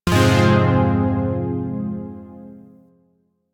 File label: Phrygian Chord G, Zariman Shawzin; Music; Warframe